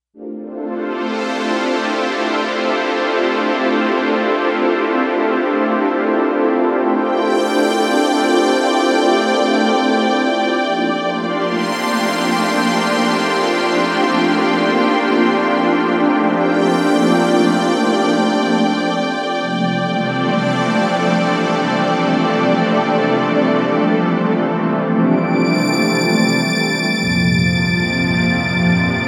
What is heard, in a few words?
SYN STRING; Digital Synthesizer